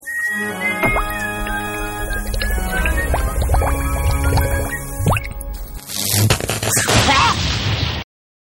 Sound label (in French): recherche chimie laboratoire boum explosion electrique accident test son; francky stein